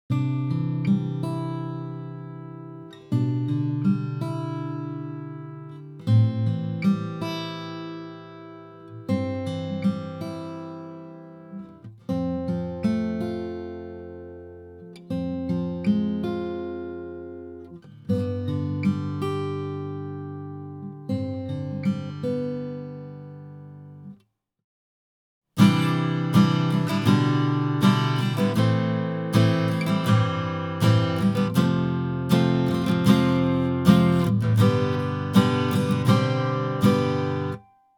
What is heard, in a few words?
B guitar; アコギB